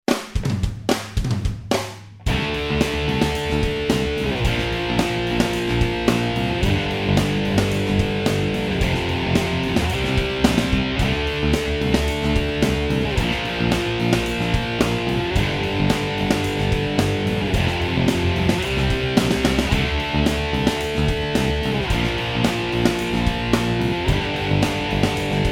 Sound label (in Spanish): base, pentatonica